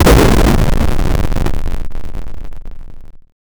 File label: retro